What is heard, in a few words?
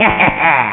LAUGH